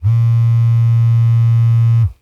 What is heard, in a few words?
Technology Electronic Cell Phone Vibrate In Pocket